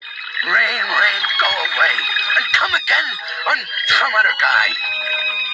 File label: rain rain go away